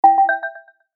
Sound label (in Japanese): 決定